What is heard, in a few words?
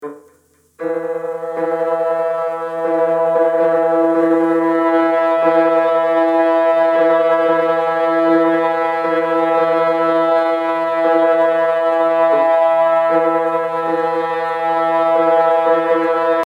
clean guitars